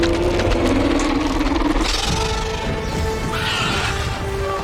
Endopede roar

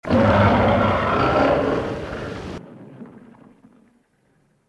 King Caesar roar

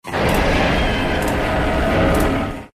roar; Pagos

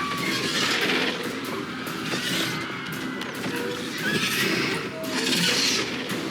roar; Needlewalker

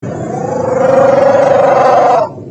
roar; Amphibion

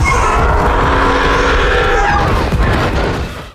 Bramble Boar roar

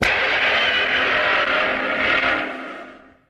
Gorosaurus Roar

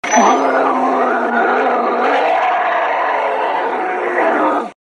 Roar; King Kong Lives 1986; Kongs

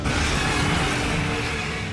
Virus King Roar

